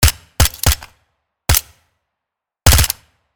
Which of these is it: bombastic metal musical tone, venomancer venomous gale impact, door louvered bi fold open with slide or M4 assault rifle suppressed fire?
M4 assault rifle suppressed fire